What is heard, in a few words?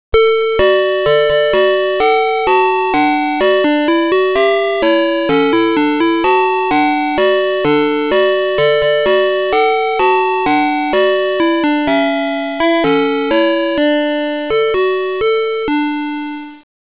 WHEEL